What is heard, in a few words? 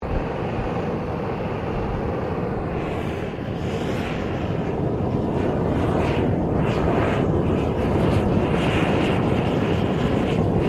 TORCH GAS FLAME OPERATE, small gas blow torch; Machinery (Sound Effects:Machines)